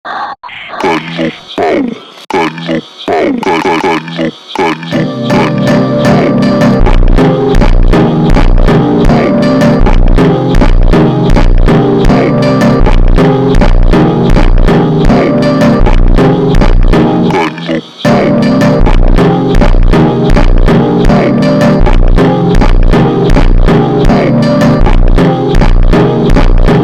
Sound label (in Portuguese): Baixo Teclas mega Slowed